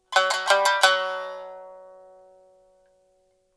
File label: Rhythm 90BPM